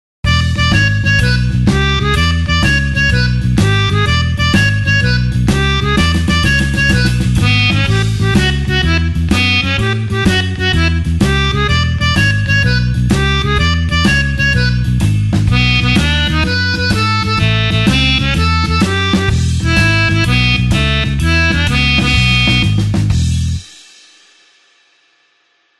blues harp shuffle E

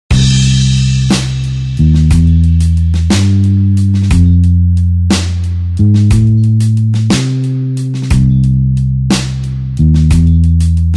blues slow

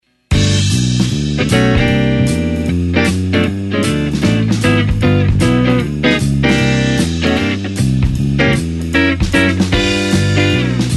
swing chord sample

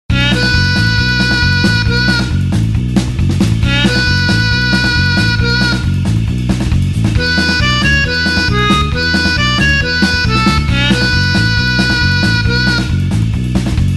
blues harp 8beat B

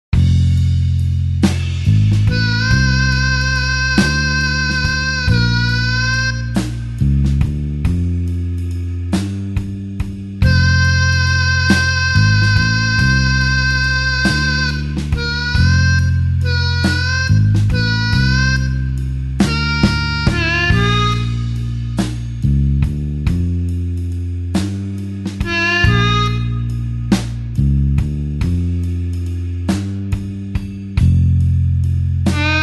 blues harp slow F

